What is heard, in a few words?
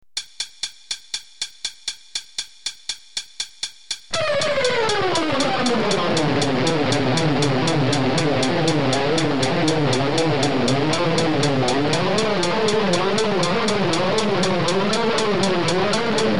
240 bpm; bumblee-bee